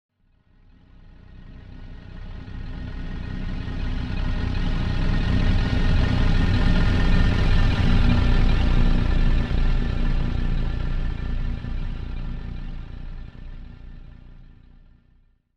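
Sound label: time machine or teleport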